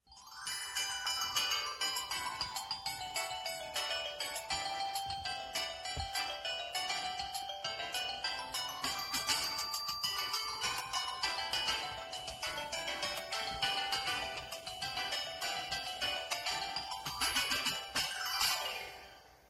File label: ringtone